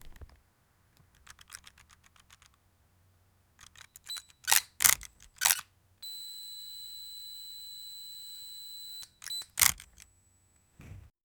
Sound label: zoomin camera sound